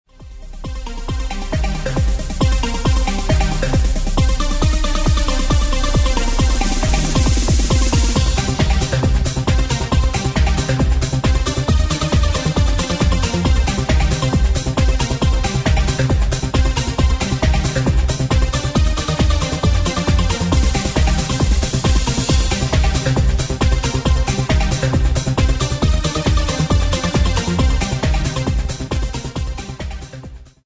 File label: outer rhythm clock extended